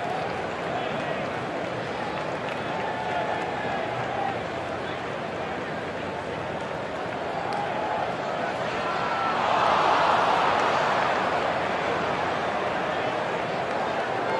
crowd cheering

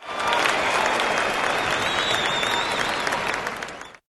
applauses

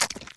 fruit slice

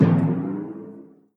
boing fruit

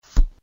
card

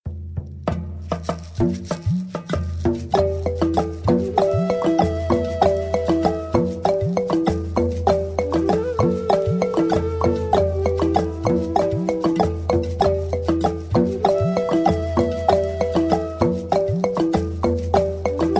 soundtrack

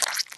fruit slice

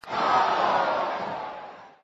reset kickup

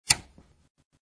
second tick

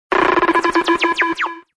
ball lose

